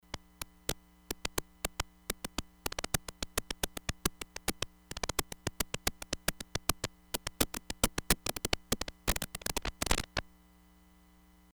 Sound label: CLICK, Crumar Bit 99; Factory Programs